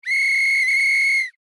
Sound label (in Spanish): pito referreeeeeee